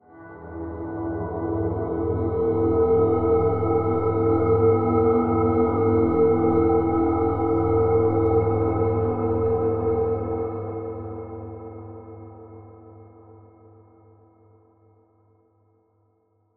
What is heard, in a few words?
suspense; DayZ-Epoch; dayz sfx